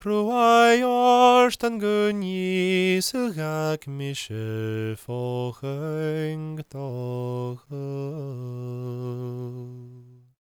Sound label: GAELIC, World Voices; ILIO; Vocal Planet